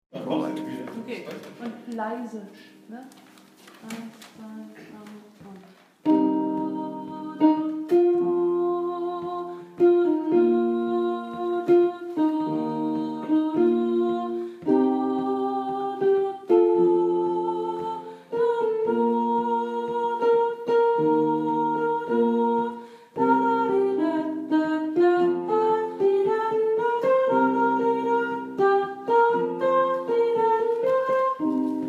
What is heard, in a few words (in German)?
Sopran